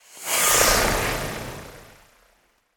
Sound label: Death Rockets; smite; god cupid skin13